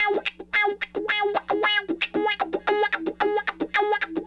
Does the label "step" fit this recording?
No